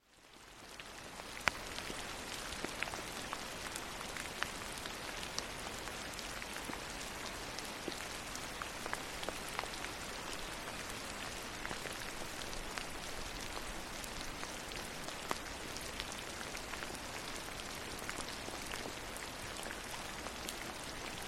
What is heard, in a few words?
rain yosemite